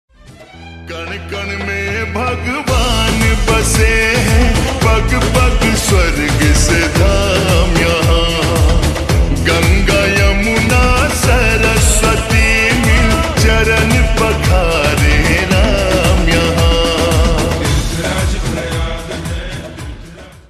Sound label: Slowed Reverb ringtone